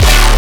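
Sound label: Oneshot D major; radiance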